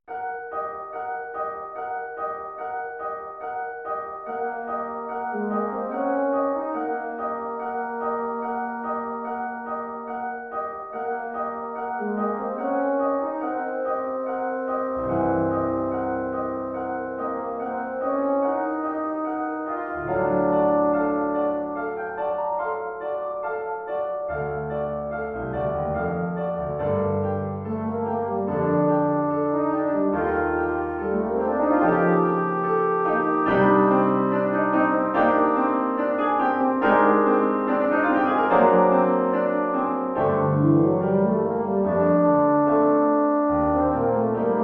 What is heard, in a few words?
Prelude and Allegro Piano Reduction